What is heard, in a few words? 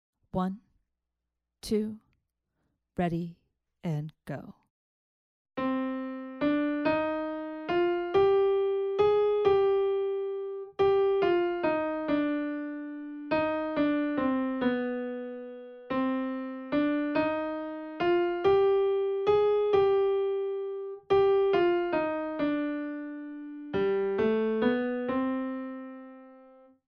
melodic dictation